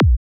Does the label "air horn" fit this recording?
No